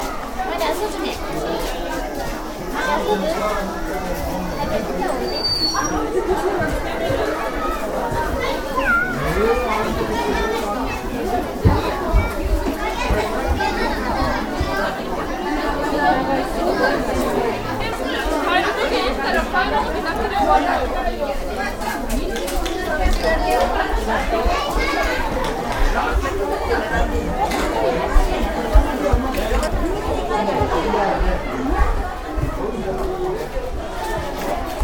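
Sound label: town summer fest; event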